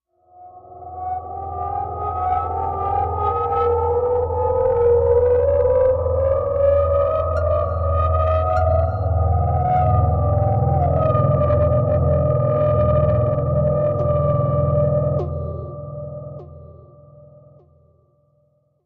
Danger Servo, Machine, Servo Start Up, High Click Echo; Increasing & Reverses